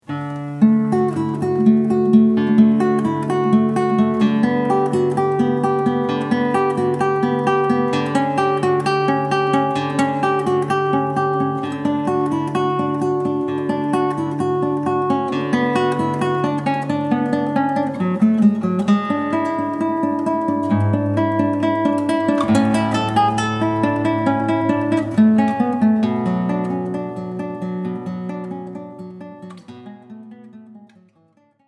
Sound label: Prelude from Cello Suite, Guitar; Prelude from Cello Suite I Bach